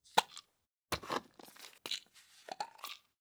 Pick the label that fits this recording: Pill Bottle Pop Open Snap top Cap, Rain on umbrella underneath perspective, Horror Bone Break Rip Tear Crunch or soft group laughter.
Pill Bottle Pop Open Snap top Cap